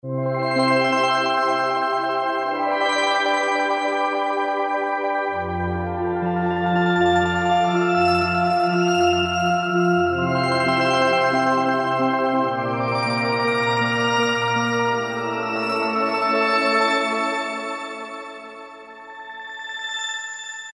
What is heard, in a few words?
Magic Bell, Korg N364; Factory Prog demos